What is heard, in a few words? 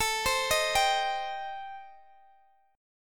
A Minor 7th Flat 5th A, Guitar, DADGAD